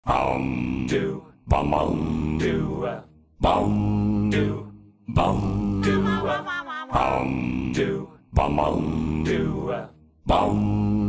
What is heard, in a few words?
Singing Monsters; My Singing Monsters; Game